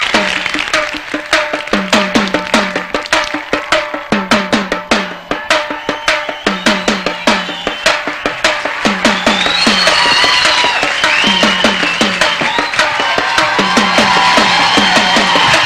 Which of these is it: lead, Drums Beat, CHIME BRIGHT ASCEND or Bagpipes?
Drums Beat